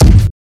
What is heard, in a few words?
airy bass drum single shot g sharp key, kickdrum tuned to the G# note